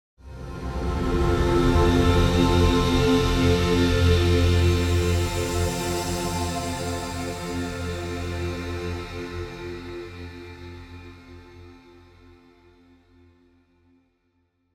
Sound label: Drone; the swapper; Obstacle Solar 1